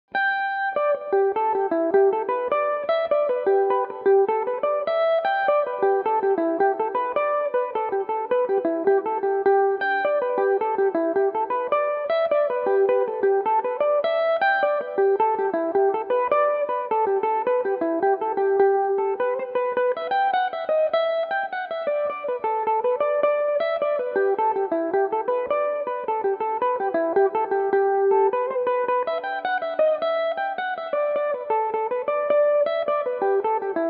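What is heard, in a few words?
G major, Irish mandolin; The Glenda Of Mayo; Belmuse EM-190 electric mandolin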